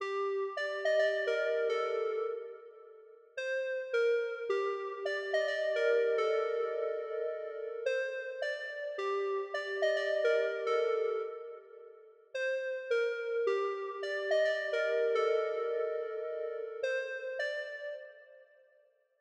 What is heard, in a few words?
107 BPM G Min Lead; Stems; Cymatics; Cymatics - CHAOS Beta Pack (MIDI + Stems); Santa Monica